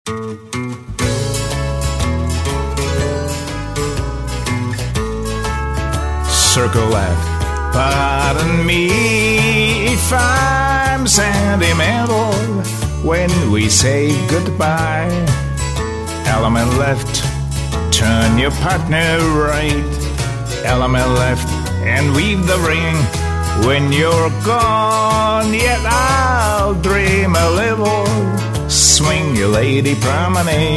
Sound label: vocal